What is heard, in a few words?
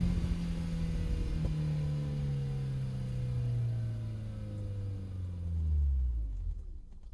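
engine high stop; Blind Drive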